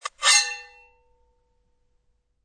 Drawing Sword, Sound of drawing a sword from its sheath.; Sword Sound Effects; Combat